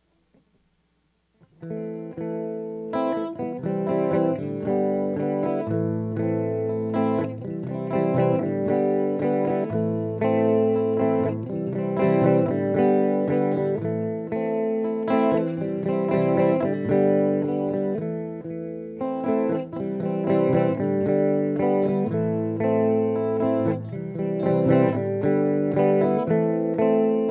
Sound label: ohio backing rhythm